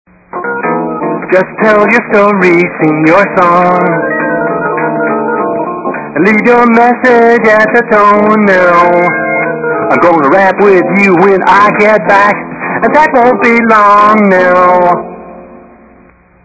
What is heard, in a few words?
jazz; RadioShack